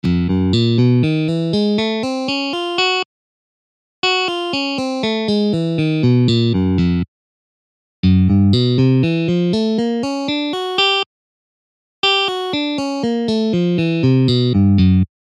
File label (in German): Stärkung des mittleren und kleinen Fingers; pfadfinden